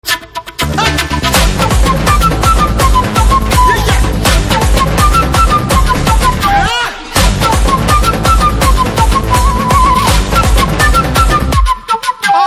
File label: BGM Flute Ringtone